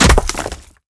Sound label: spider monster foot hit